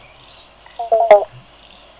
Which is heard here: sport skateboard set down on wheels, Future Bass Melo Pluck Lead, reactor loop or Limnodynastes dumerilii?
Limnodynastes dumerilii